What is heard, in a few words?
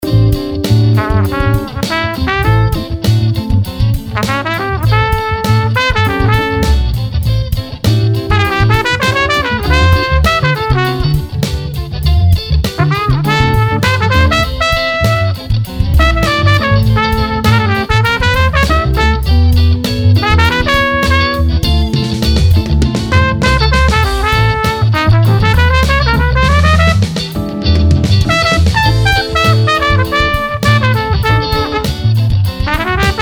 Funk In A 100 Solo Trumpet; Blues Solos